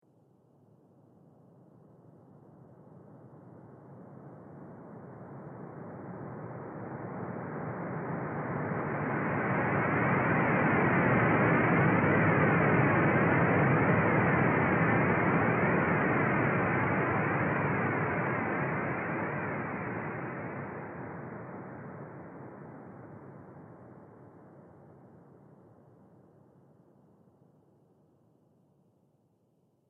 Galaxy Whoosh Faint; Sound Design; Whoosh Sound Effects

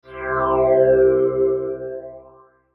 Logo Tonal Swell Flange; Sonic Logo; Blastwave

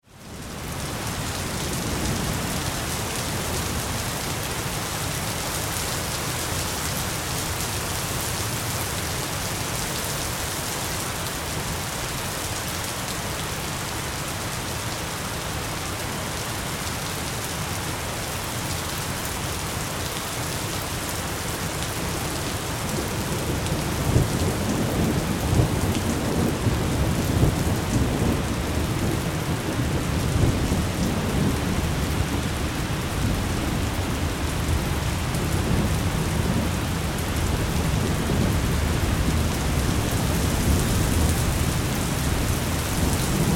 Weather Thunderstorm Rain Wind Blown Ambience; Thunder Sound Effects; Thunder (Sound Effects:Weather); SFX Bible 6

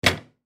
Arcade Game Claw; Machine Hit Hard; Technology; Arcades; Games; Blastwave

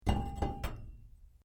METAL PIPE SMALL STRIKE; Metal (Sound Effects:Materials)

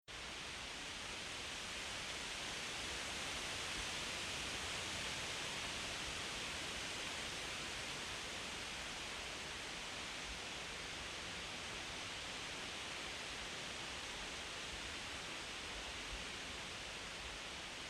Nature Heavy Wind In Trees Night Leave Rustling; Wind Sound Effects

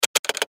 Button Switch Gear Multimedia; Web Interactive; Blastwave; Technology

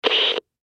Cb Radio Static; Technology; Miscellaneous Electronic; Blastwave

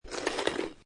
Snow And Ice Ice Skate Slide; Weather

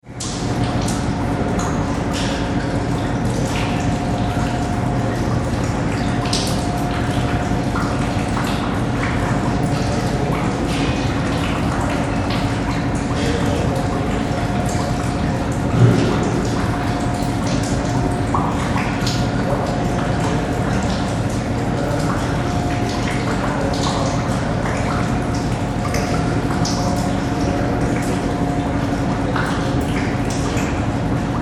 Ship Oil Pipeline Service Vessel Sea in Moon pool; Tom Hutchings

